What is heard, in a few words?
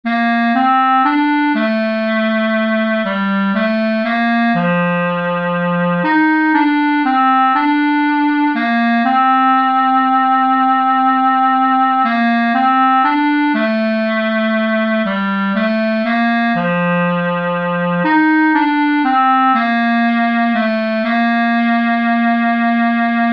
clarinet